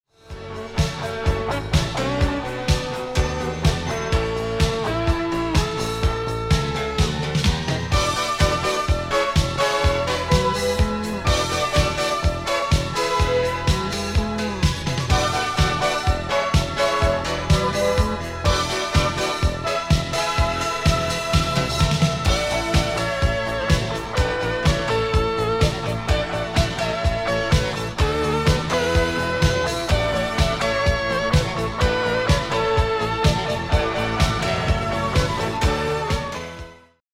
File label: Brivido strum